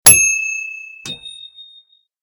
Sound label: Bell